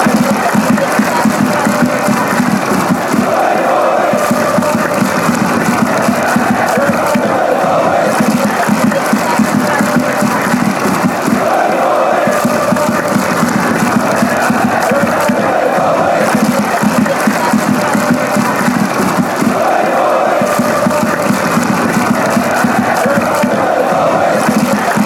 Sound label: bvb bvb fanchants